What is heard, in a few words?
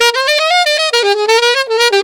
Alto Sax, Alto Loops, Jazz Solos and Sections, Traditional; Sonic Foundry (Sony Creative Software)